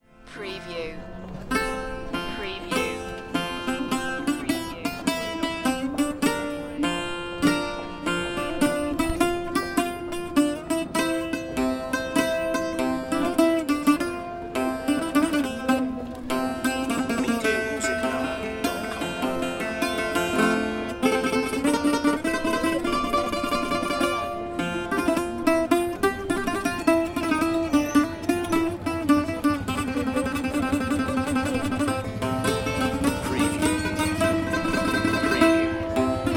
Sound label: Street Music Bouzouki